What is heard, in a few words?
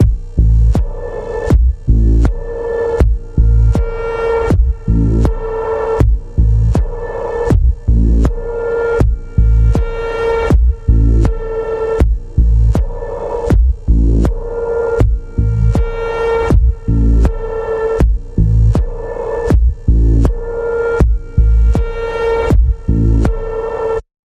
Throbbing Pulse A, Key of A